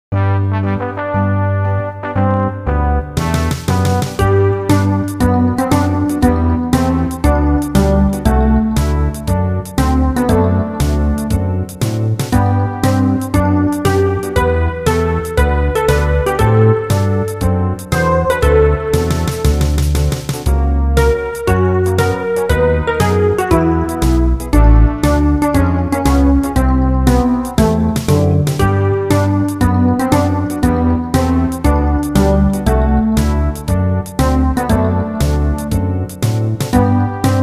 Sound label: pianosong